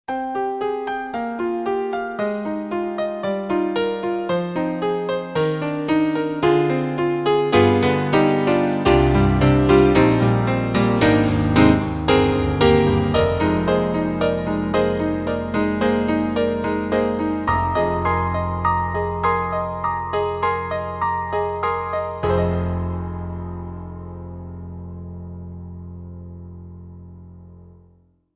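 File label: Roland JV-880, piano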